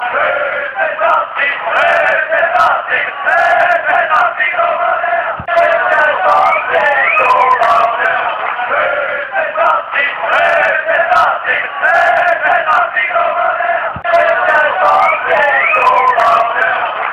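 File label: fanchants, football chants; dirties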